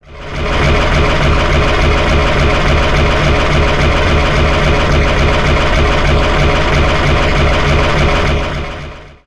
dvigatel i porshni

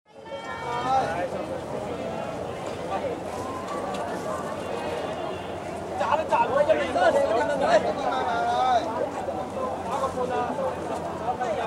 rynka

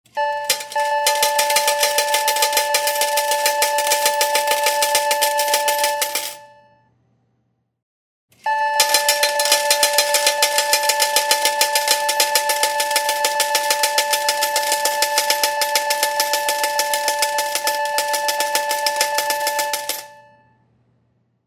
zvuk monet iz igrvogo apparata

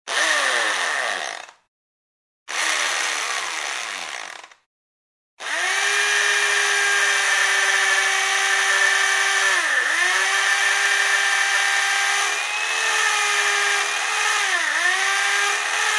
работа перфоратора

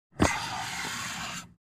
pnevmaticheskaja dver avtobusa

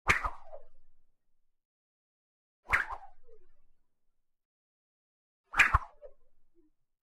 vzmah knutom

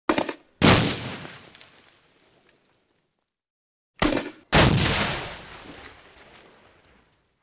brosok i vzryv granaty zvuk zemli

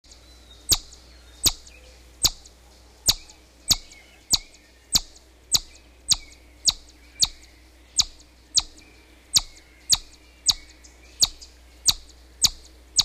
piski burunduka

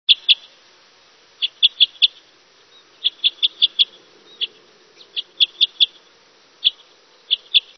golos klesta elovika

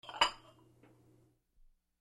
chashku stavjat na bljudechko

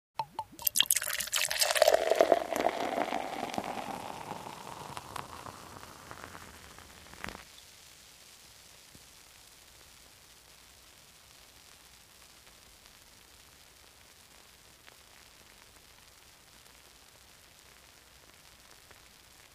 zvuk piva nalivaemogo v bokal

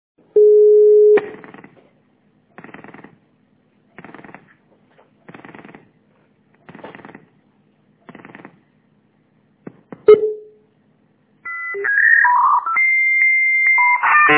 zapis zvuka dial up modema

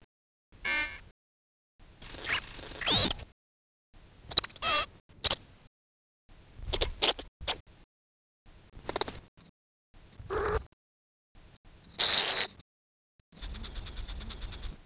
zvuki krapchatogo suslika

